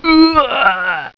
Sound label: silly